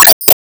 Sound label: Enemy Water plant Tentacle Extend